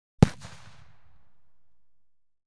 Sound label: Gelignite, 3x8 Oz Sticks Explode; BBC Sound Effects Library; Explosions Guns Alarms